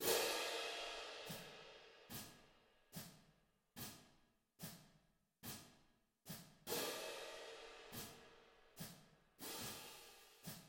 cymbals

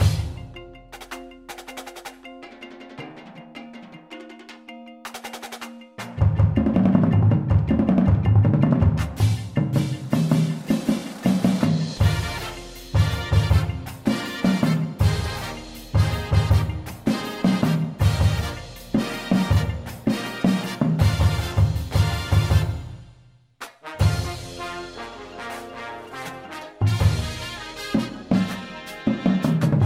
bass w music, drumline

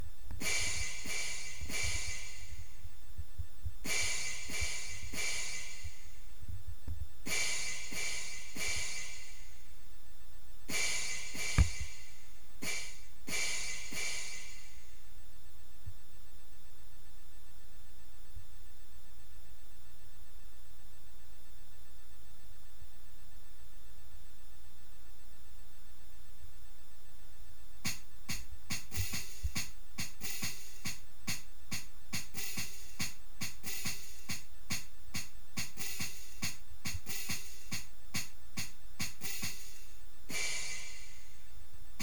cymbals

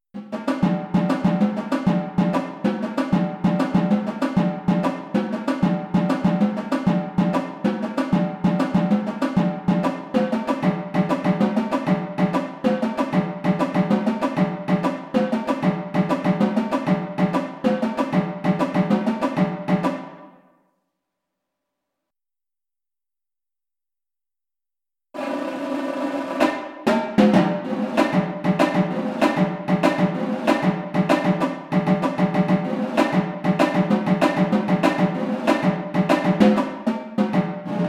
tenors, drumline

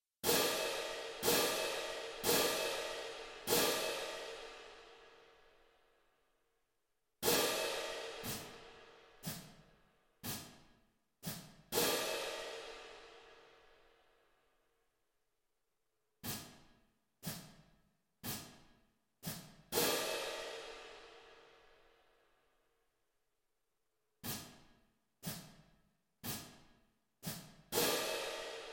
cymbals

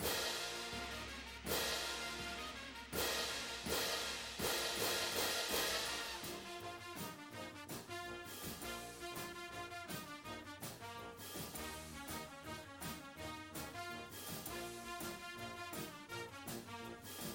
cymbals w music, drumline

